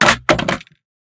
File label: riffle drop wood; STALKER; IWP